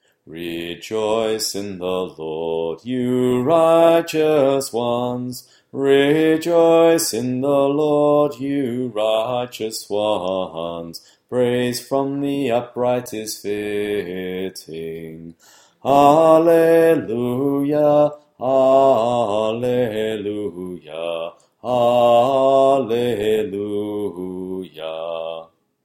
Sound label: Communion Hymn; Martyrs Carpus, Papylus and Agathonica